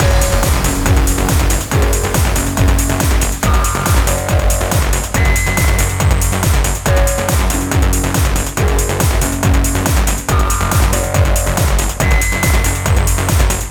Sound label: Matrix